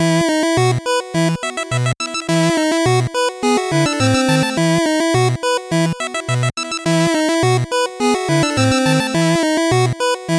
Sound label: 8bit bgm fast